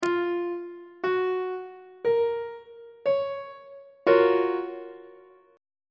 g flat major 7th 3rd inversion on treble clef

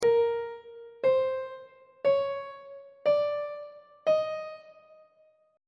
a sharp 3rd intervals on treble clef

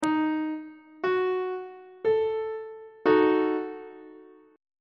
d sharp diminished chord on treble clef

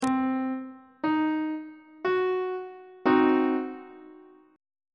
d double flat diminished chord; treble clef

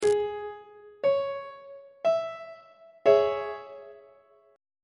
d flat minor 2nd inversion on treble clef

